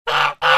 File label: goose honk